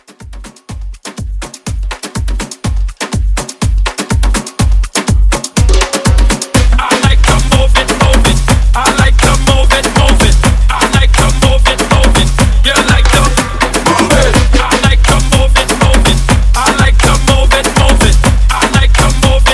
Tribal Hype

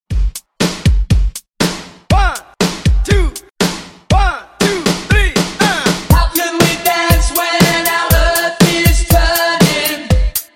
Pop Rock 80s

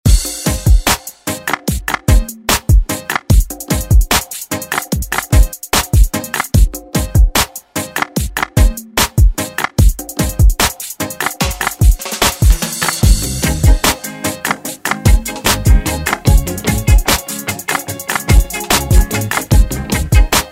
Reggae Ext Redrum

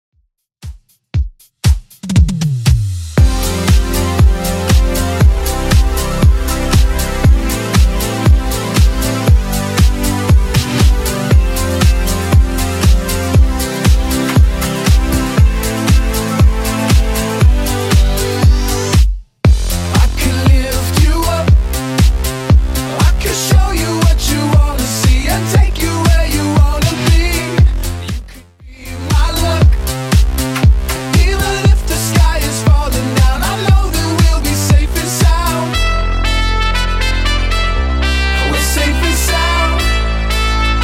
Top40 Redrum 118 bpm

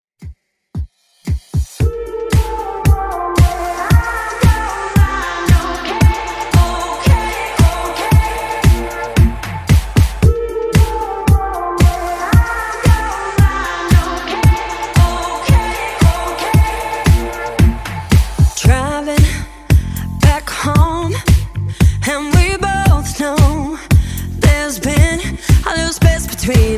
Top40; Redrum

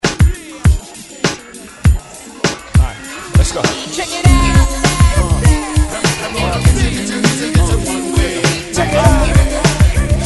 20s Hype ReDrum 100 Bpm; DJDOLLS

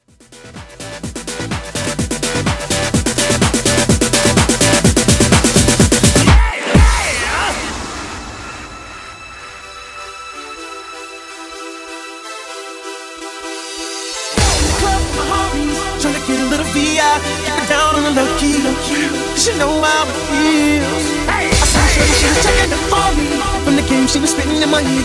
Epic Mashup 126 Bpm; djyan

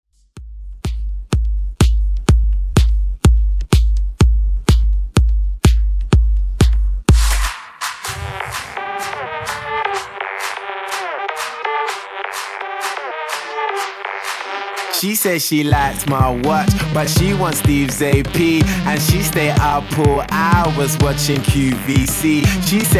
Redrum

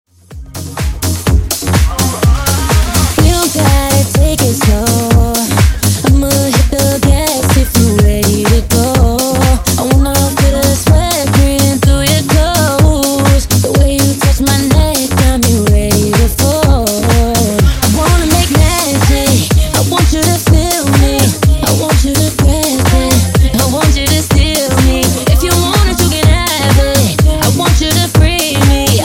Funk Bootleg 125 Bpm

